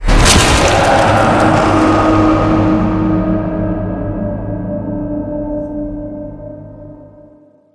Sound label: Cabin - Basement Bear Sounds